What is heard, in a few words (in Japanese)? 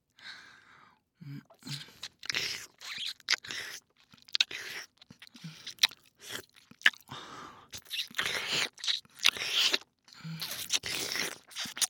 無限ループ耳舐め